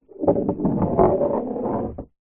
sport pool ball roll inside table after being potted